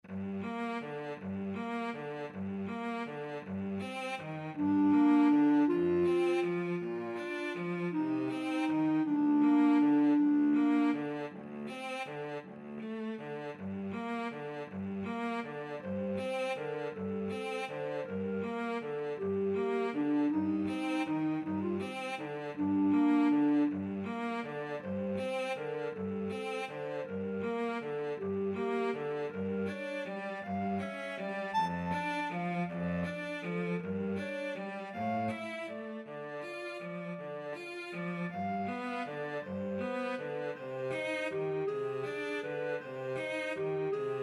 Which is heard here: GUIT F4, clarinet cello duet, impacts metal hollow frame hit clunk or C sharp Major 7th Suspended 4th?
clarinet cello duet